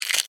Camera Shutter, Technology, Camera, Shutter, Take, Picture; SoundEffects-BlastWave